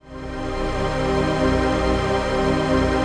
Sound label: TRANCPAD; Best Service; XX Large Pads; Sample CDs